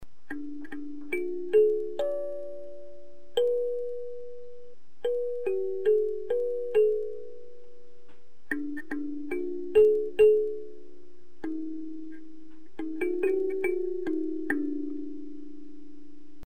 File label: thumbpiano